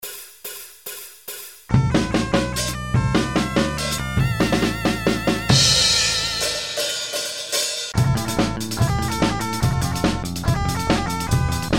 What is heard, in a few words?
drum scratch